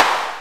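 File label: GUNSHOT snare, DrumBase, KITS; Crypton - DrumBase5000; BABY KITS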